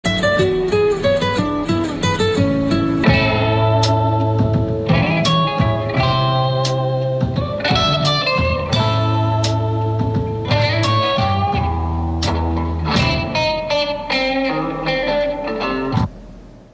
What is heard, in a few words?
nokia ringtone